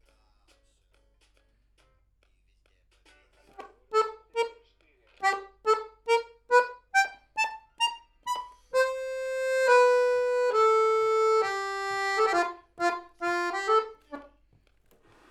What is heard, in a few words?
Accordeon MAIN